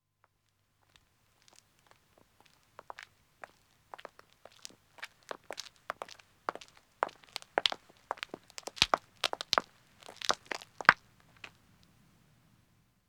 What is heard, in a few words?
Country Road Walking 1 man &, Footsteps; The BBC Sound Effects Library